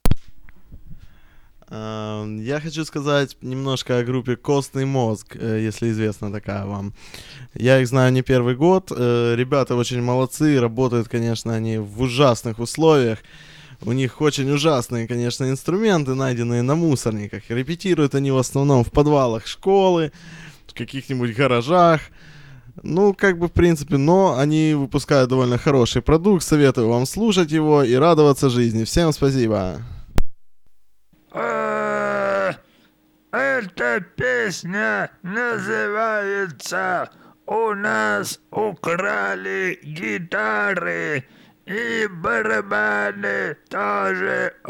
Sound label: intro guitars